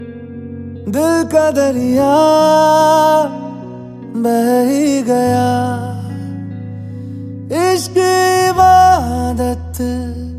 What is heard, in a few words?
Movie Song Ringtone; Kabir Singh